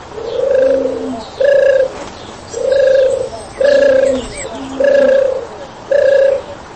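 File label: pigeons not afraid of people cooing near them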